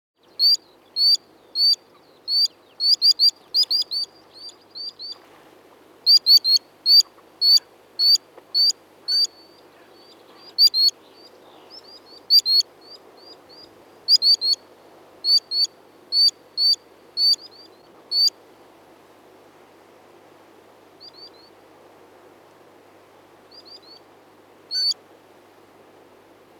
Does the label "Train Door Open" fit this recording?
No